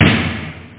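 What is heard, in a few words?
natural snare; OctaMED SoundStudio V1; jazzies samples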